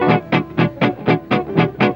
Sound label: EGUIT GROOVE; Best Service; World Colours